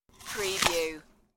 Apple Bite

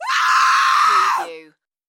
Scream Female

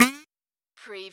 CARTOON DUD

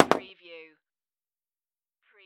Pinball sound, ball drops

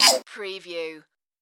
Tui Kiss

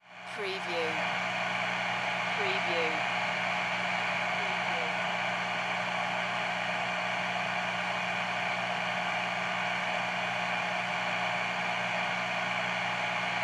Guitar Amp Hum

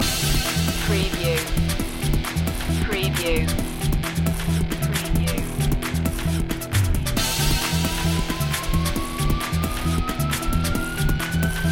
burn out loop

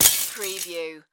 Bullet Impact Glass

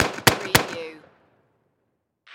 Pistol 45 Magnum

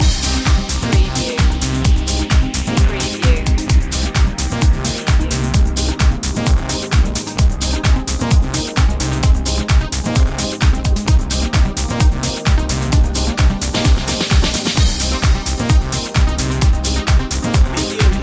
plasma life loop

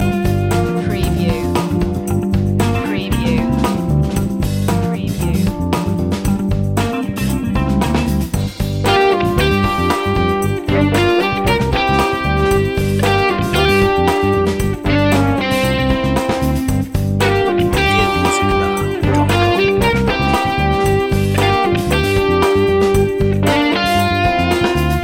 cocktail funk loop

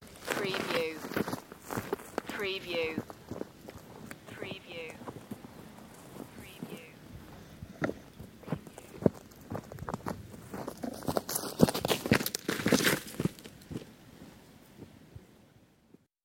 Footsteps, Walking By on Dirt

